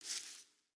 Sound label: Foot Grass Walk, footsteps